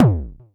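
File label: Club bass drum, Jomox Airbase99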